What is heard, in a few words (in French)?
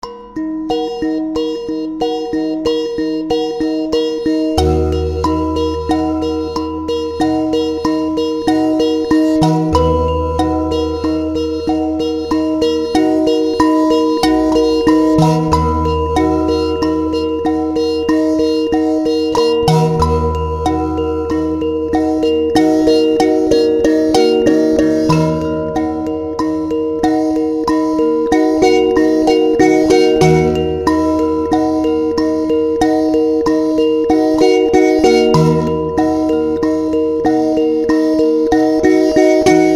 Mbira aimants